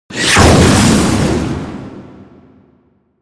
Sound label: ATTACK